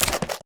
嘎枝脚步声

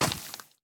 脚步声; 尸壳

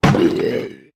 死亡声; 潜影贝

